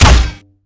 speargun; garrysmod

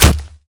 bow; garrysmod

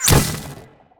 warp; garrysmod

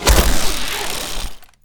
hook rappel; garrysmod

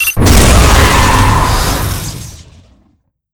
new vaporizer; garrysmod

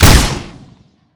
punish rocket; garrysmod

